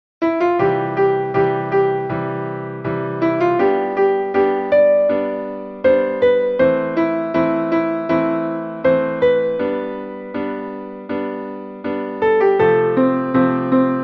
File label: with chord; 願你天天得福